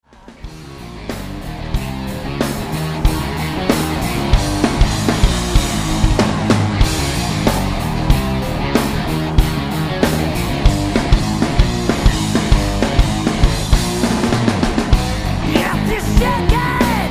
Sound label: rock medley